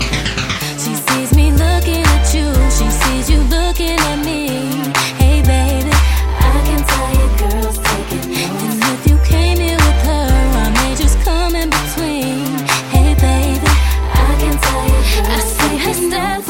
breath takin tone, ringtone

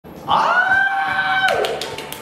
screaming

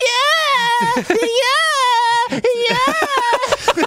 Eyeaaaah x3

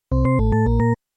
underworld sms; smb

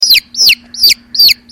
chick; animals

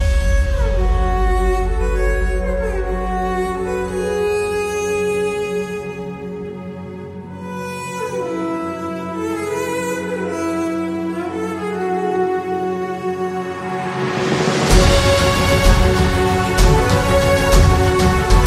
violin

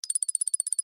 Relaxed Melodious

